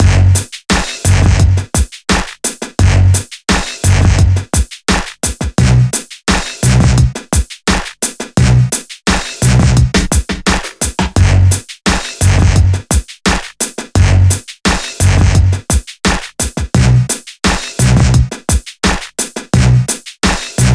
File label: Go easy with rap